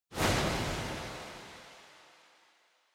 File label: Camera Motion Stereo Pan Reverb; シーン・カメラモーション